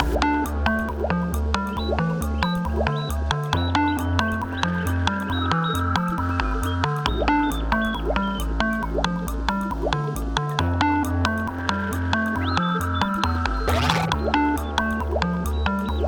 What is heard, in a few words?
BOSS NO DRUMS